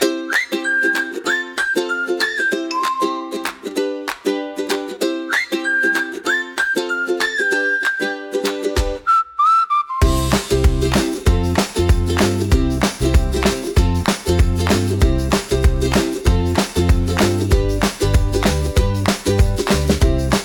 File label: Sunrise Sidewalk Parade, hand wraps whistles